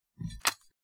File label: Ringing Katana Tsuba